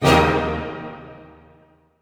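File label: D# major fortissimo, orchestra hits; Sound On Sound - Peter Siedleczek's Orchestra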